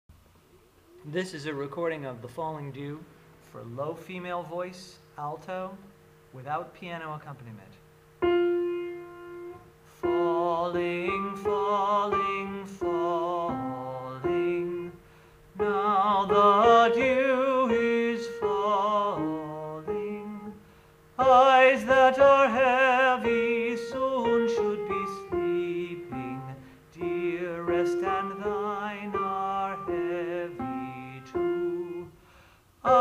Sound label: the falling dew low voice no piano